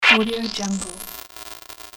electronic startup sound of electricity

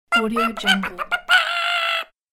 cartoon winning melodic voice

